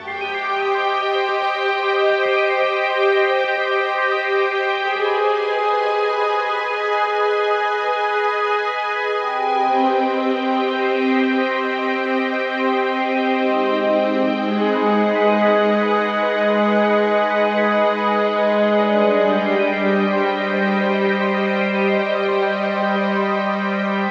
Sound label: Water Style; RollerCoaster Tycoon